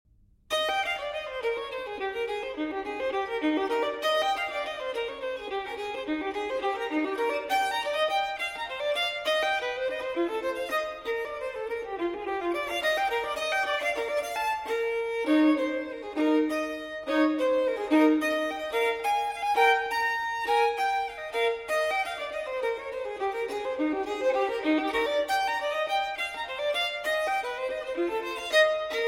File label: Solo Violin in E Major Allegro; TWV 4021; Telemann Fantasia